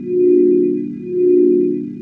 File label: Sci-fi Hover Bot Loop; SFX Kit; Sci-Fi Loops